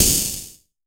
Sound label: noise percussion; AKAI S6000 CD-ROM - Volume 5